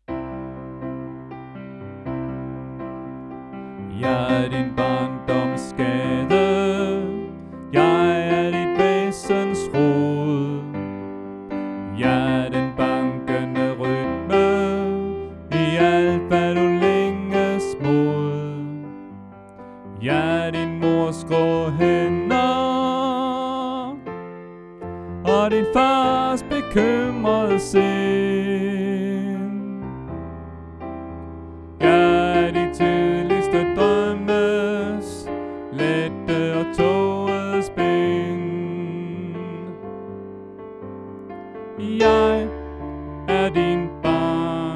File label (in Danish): Sopran; oevefiler